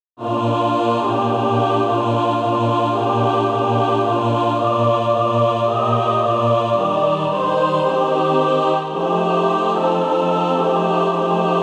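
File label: mixed, SATB Practise Music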